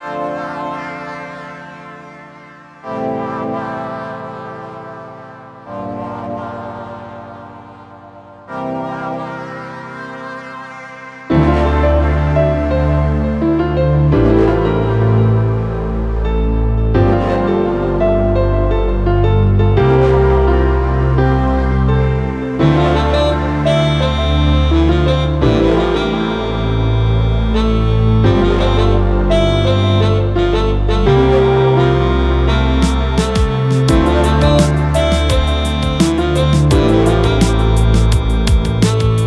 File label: sax; I Really Like Tenor Saxophone